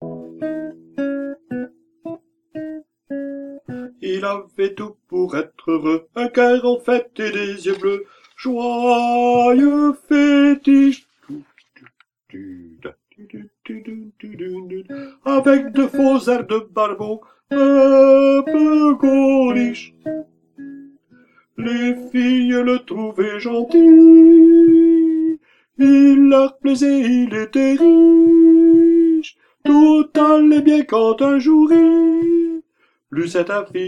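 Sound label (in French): sept ans de malheur tenor; repertoire chorale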